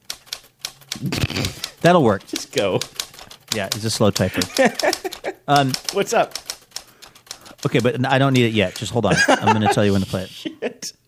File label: typewriter sound